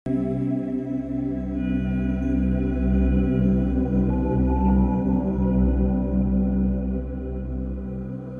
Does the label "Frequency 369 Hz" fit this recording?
Yes